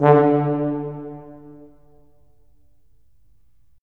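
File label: brass tuba; LA Composer vol. 3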